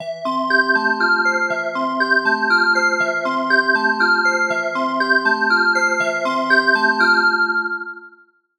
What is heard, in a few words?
Analog Bells; Cherry Audio PS-3300